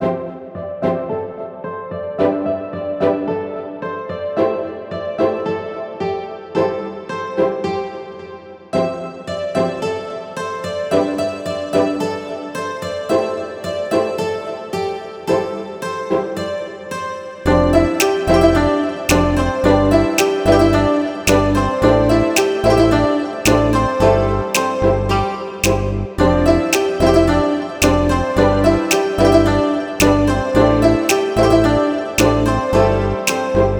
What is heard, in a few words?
China Rain